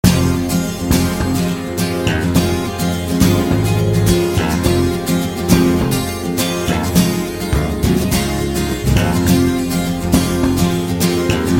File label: Summertime Synth; Snoot Game